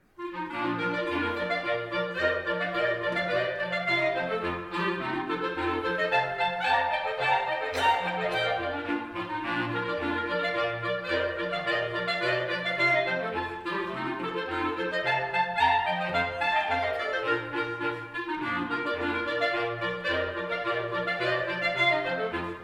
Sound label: polka, clarinets; Traditional